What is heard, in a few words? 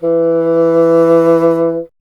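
wind bassoon E; Miroslav Vitous - Solo Instruments VOL-1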